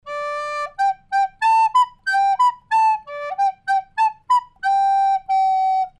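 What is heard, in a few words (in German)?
Blockflöte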